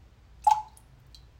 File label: drop